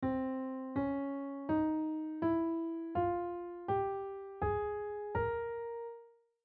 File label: Spanish Scale